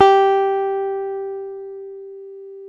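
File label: jazz guitar; Univers Sons; Jazzistic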